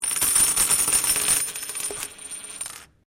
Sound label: Coin Penny Multiple Drop Wood